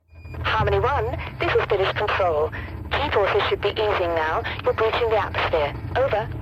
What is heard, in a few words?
breaching the atmosphere; BigFinish; Living in Harmony